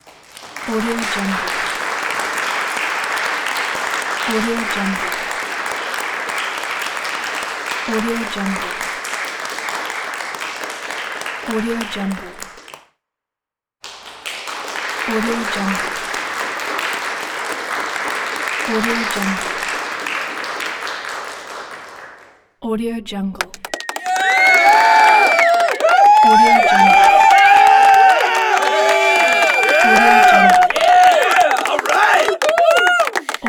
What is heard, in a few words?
applause auditorium